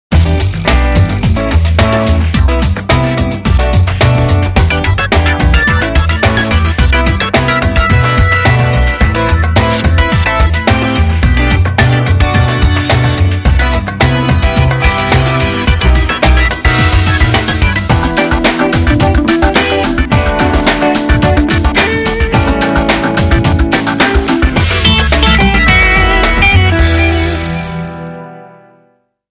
FUNKY ORGAN

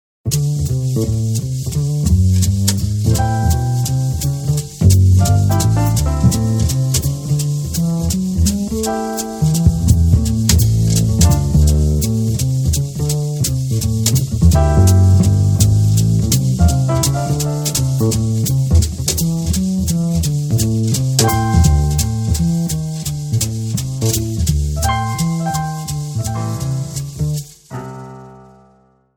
JAZZ FLOW

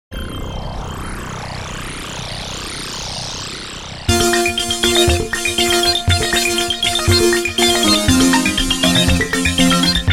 ELECTRO SQUEAK

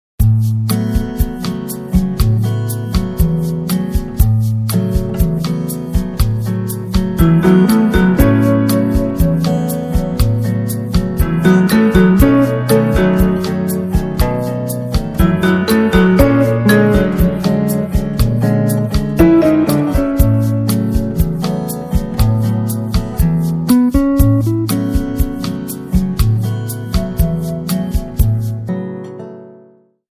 BOSSA

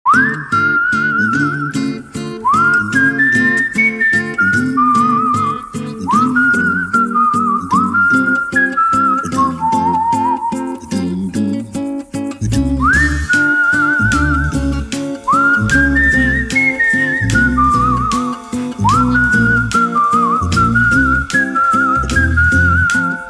EZY STRUM

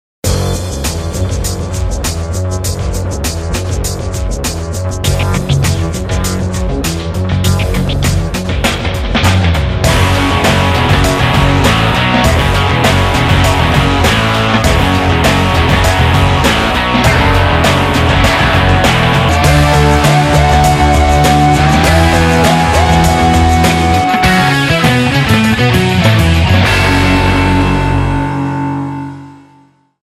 HEAVY GROOVE

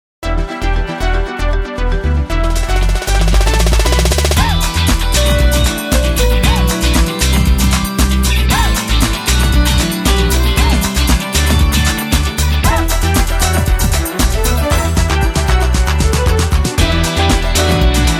ISLAND BEAT

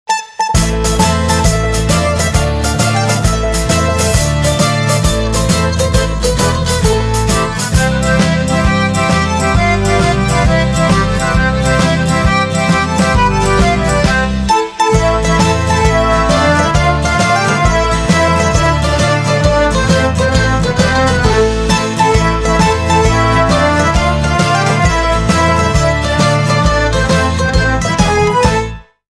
TARANTELLA NAPOLETANA

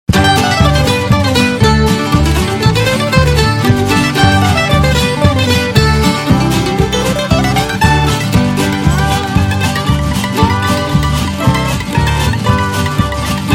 MANDOLIN STOMP

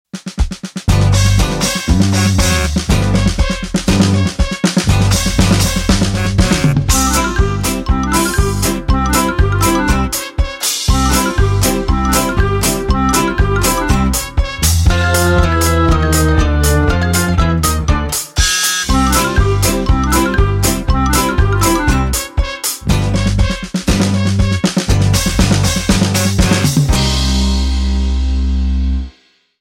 beep beep

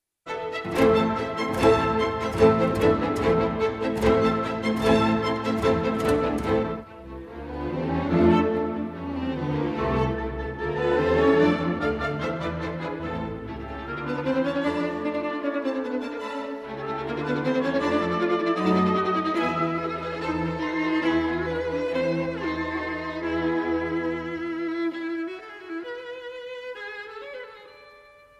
VIOLIN CONCERTO 3RD MVT; VIOLIN CONCERTO NO 5

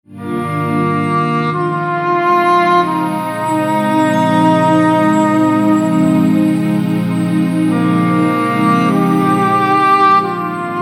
CALM & CLEAR

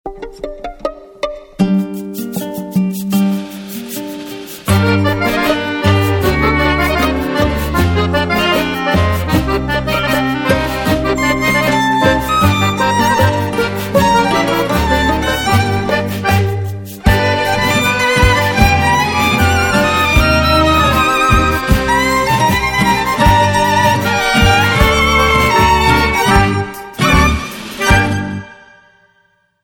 PASSION TANGO

